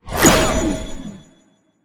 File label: cast, Magic